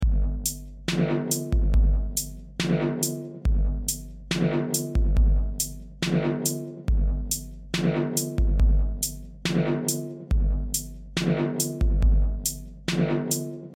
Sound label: nano resonance; nano resonance 6 var 1